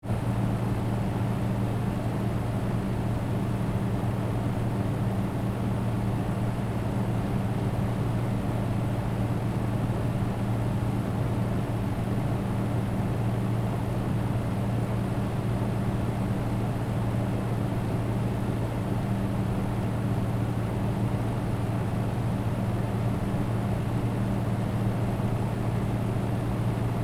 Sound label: Air Conditioner external; spoteffects